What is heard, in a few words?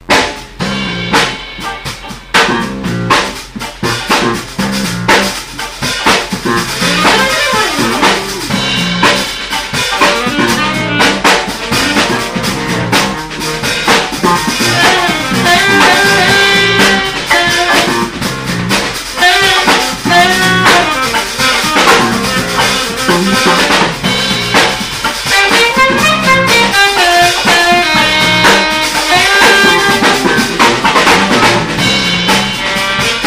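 slap, Fender P Bass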